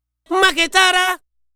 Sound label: African Harmony Vocal